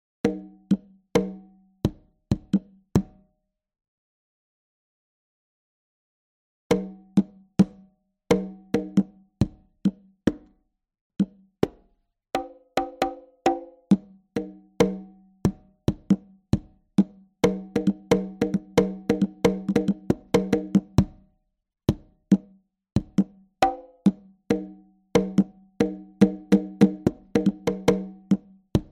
first congas

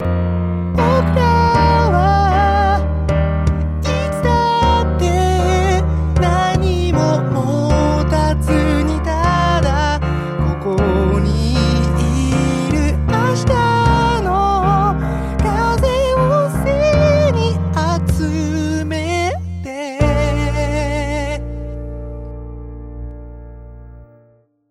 bass truck

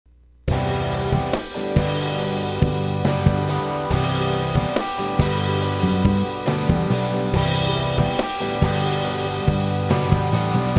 overdrive

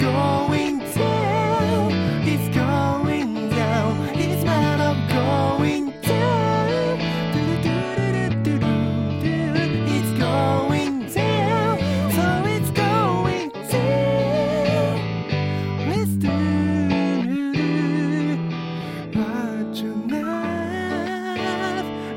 bass

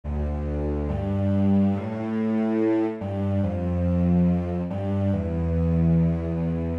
bass

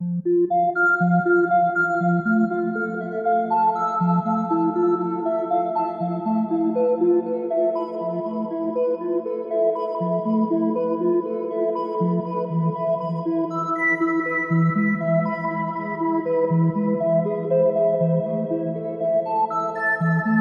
ARP Abyss

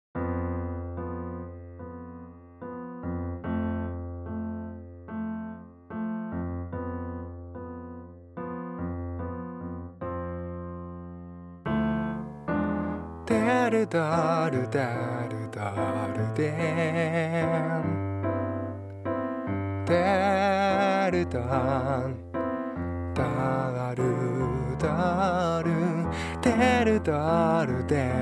new key

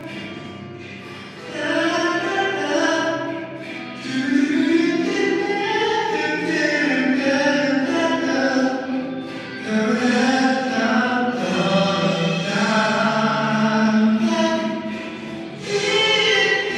start epic small plate

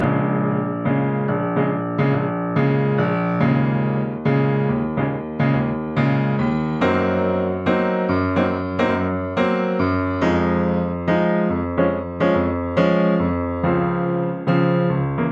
piano mix

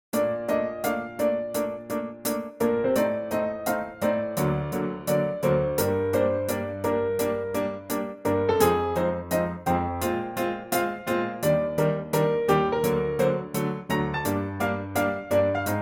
drum

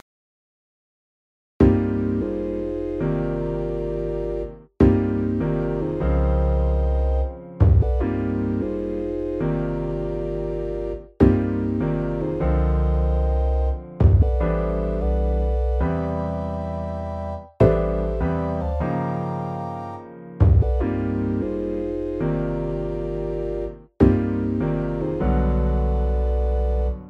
piano

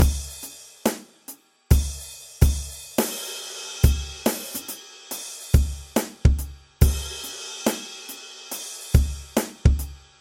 drum mix

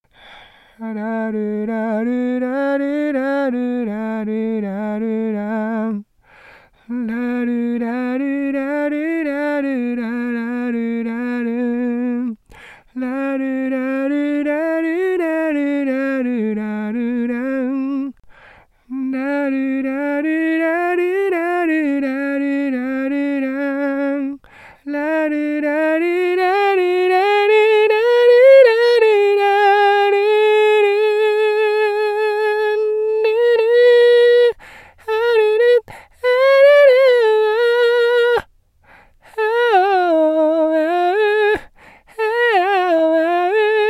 vocal

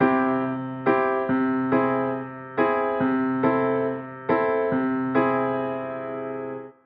piano after

